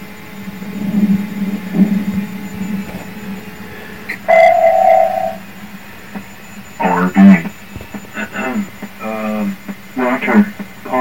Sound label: A Circulator, RV